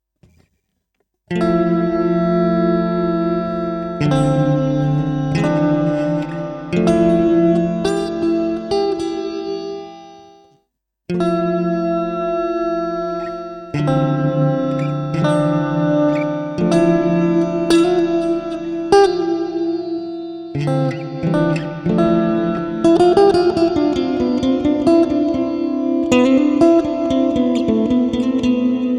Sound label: Superego plus Sustain Mode